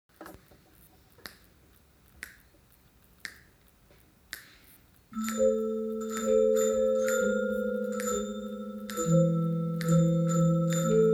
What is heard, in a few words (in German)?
metallophone